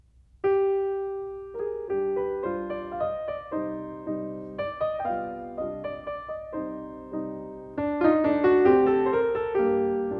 Mazurkas for piano, No. 1 in C minor; Chopin

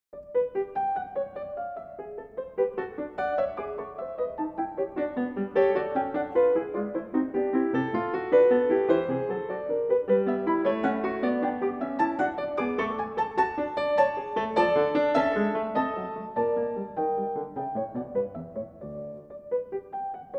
Gigue G-Dur, piano; Mozart; The Complete Piano Sonatas; Eine Kleine Gigue; K.574

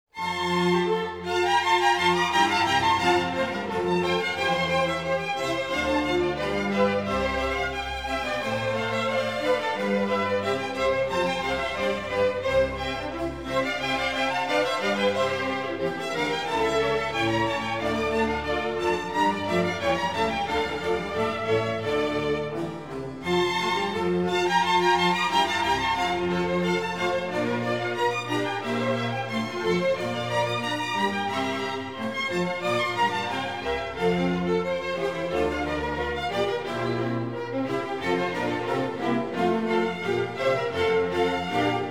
Concerto grosso in F major, Allegro; Pergolese

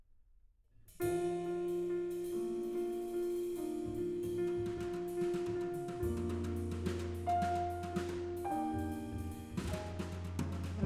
Sony C 800 G, microphone; Das Mikrofon, Vol. 2; The History of the Condenser Microphone

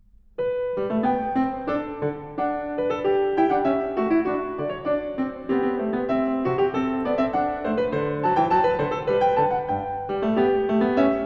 Variation, Canon on the sixth; Bach; Goldberg Variations, BWV 988

